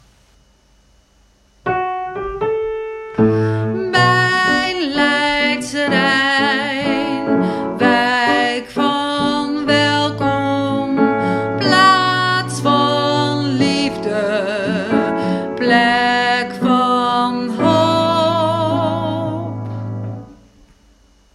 Sopraan Refreintjes

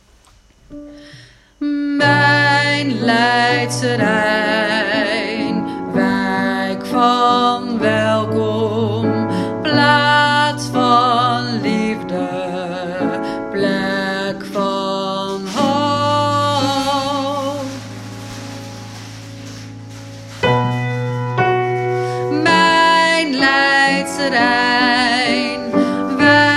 Refreintjes Alt

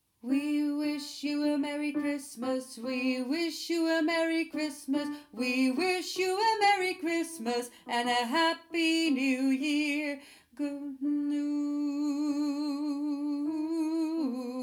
sopraan; we wish; 2e sopraan

